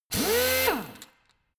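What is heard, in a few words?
impactor soft long, drill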